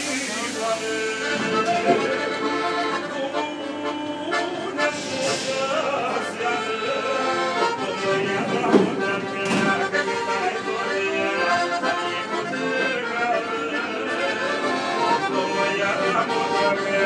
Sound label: French busking on the metro